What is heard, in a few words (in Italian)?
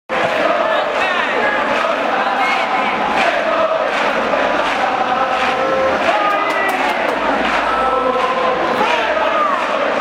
bevo e canto, cori